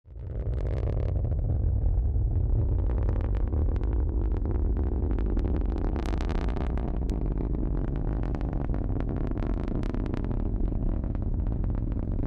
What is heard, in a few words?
ringtone launch; SDO launch Nats